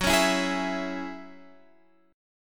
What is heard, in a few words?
F sharp 7th Suspended 2nd, Guitar Open F; x,13,13,11,11,11; strummed